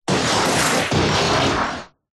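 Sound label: Loud Crash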